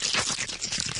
infector idle; flood

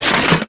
weapon pickup

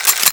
sniper ammo; serioussam2

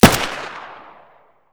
uzi fire

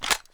mp5 40cal mag out

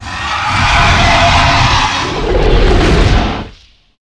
roar, dragon

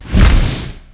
flap, dragon

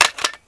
cover down, weapons

